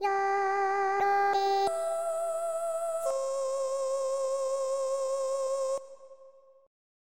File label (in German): Jodel